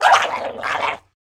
Imp Attack

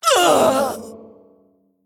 Female Mogu Death

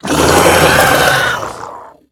Wild Bloodstinger Death

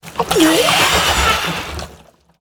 Tentacle Death

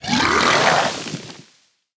Vilespine Slayer Attack

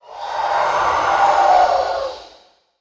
Windswept Elemental Death

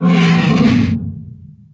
Hippogryph Death Vox Underlay

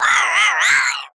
Darkmoon Rabbit Death

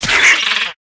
Death

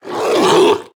Lakkari Felhound Death

